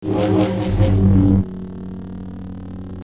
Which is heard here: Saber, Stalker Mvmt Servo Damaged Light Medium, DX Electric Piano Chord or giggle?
Saber